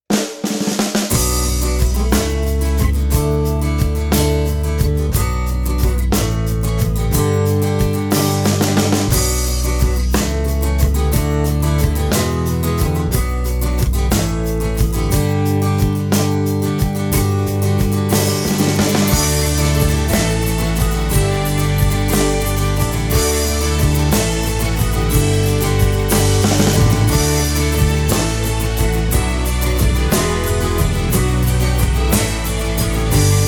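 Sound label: guitar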